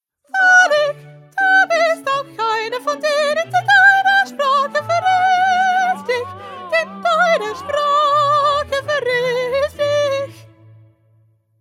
Soprano

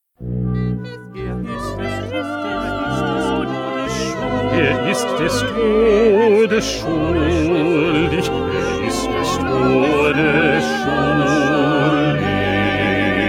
2nd Bass

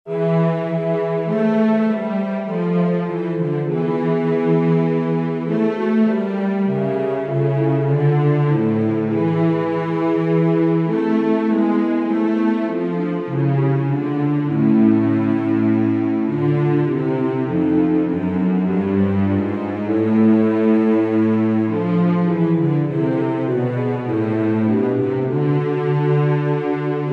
Instrumental; Bach; St John Passion

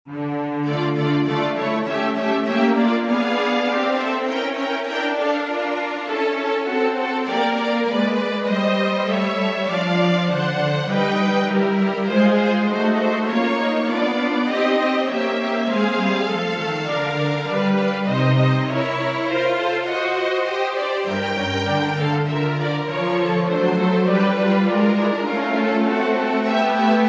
Instrumental; Bach; St John Passion

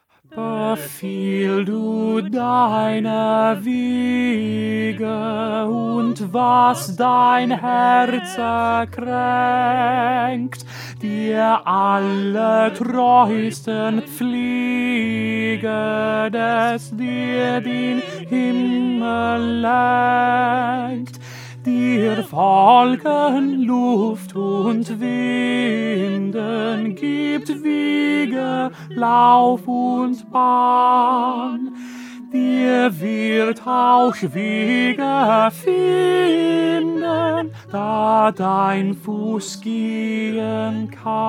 Tenor; Bach; St Matthew Passion

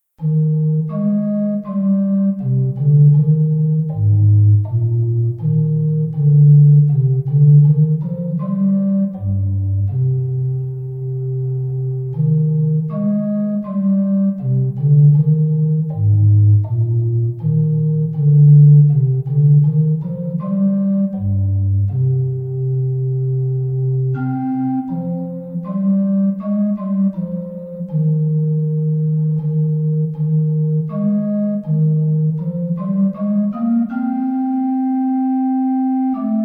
Instrumental; Bach-St-Matthew-Passion-3

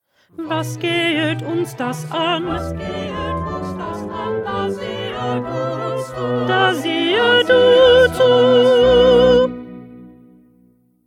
2nd Alto

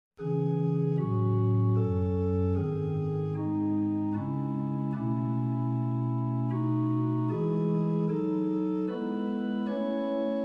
Instrumental

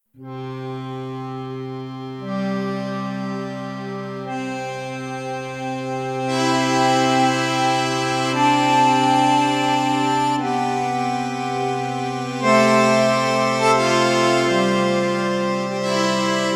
Petite Messe Instrumental; Rossini

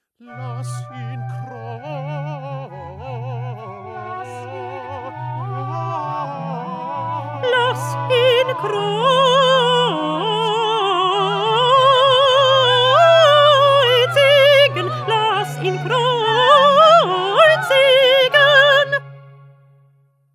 Alto; Bach; St Matthew Passion

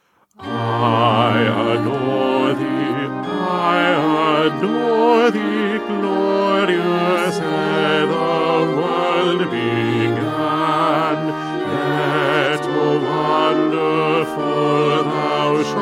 Bass; Stainer; Crucifixion

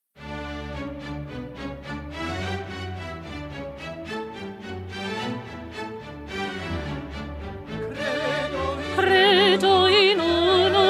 Alto; Haydn; Nelson Mass

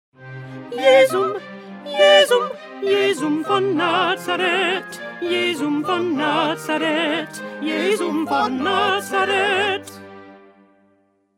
Alto; Bach; St John Passion

